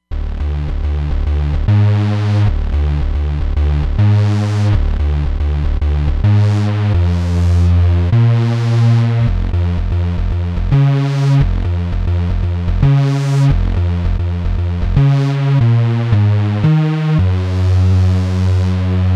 Unison Bs; Roland Fantom XR

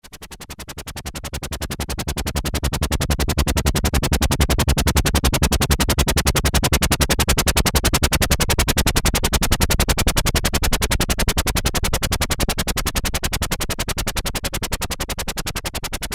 Helicopter, Korg Poly-800

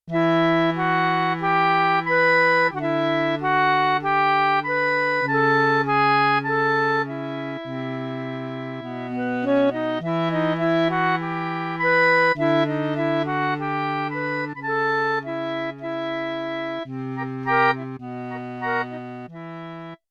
Octave Duo, Clarinet; Yamaha TX16W

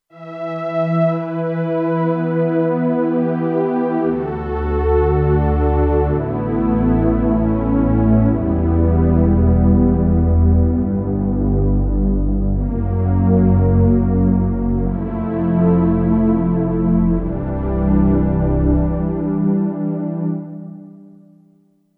Matrix Mellostr, Kurzweil K2500X; Factory Programs

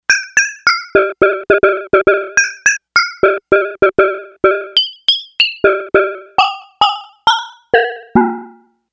Yamaha DX11 Syn Gameran; Factory Preset Voices

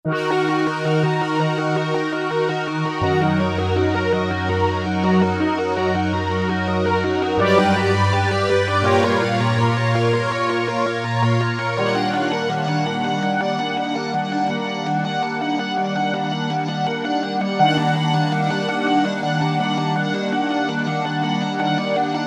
STEP STRINGS, Roland SC-880; Factory Patches

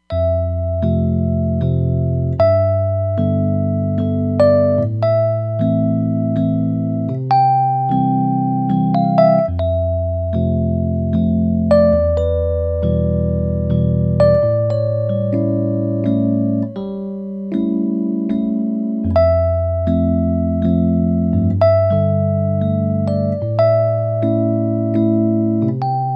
Celestial EP, Roland Fantom XR

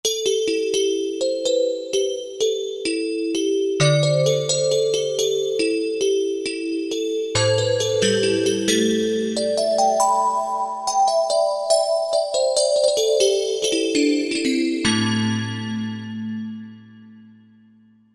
Struck Bell, Korg Wavestation

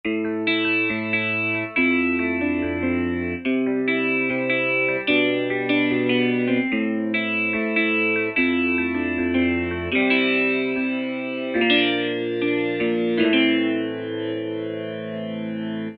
Gtr, E-mu Morpheus; Guitarzn

